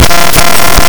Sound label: Glitch Sound From Raw Email